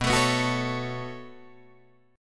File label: B 7th Suspended 2nd, Guitar, Drop D